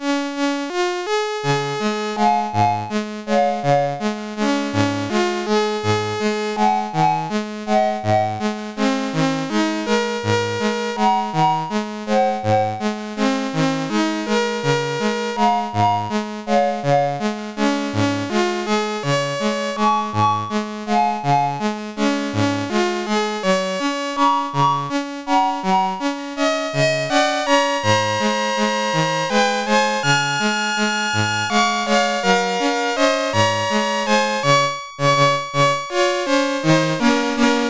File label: Synth Sample III, sid CSG8580R5